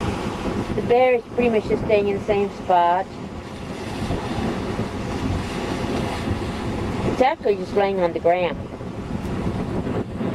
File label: bear